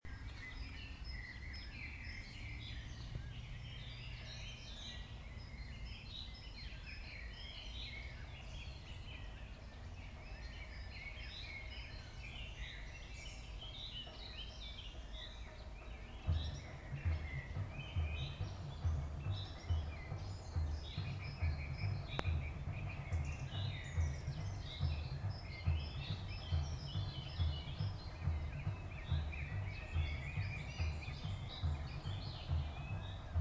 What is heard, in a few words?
nice twittering in berlin s tiergarten interrupted by a samba group